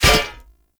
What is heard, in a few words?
glyph puzzle disc land; Warframe; Duviri